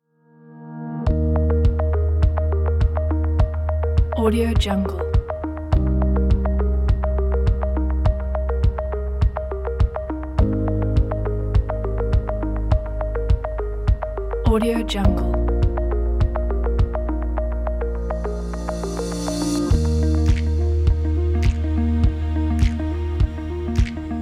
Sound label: sentimental calm